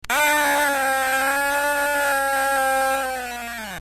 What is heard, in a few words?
scream; impossible mission